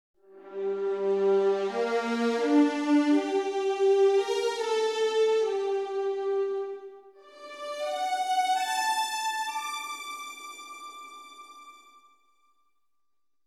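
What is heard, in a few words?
strings passage legato script; expression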